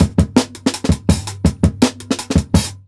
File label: Jungle Breaks